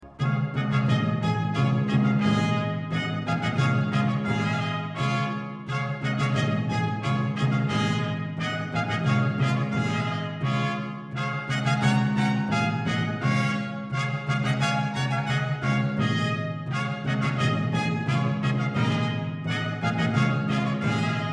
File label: trumpet gavotta; haendel georg friedrich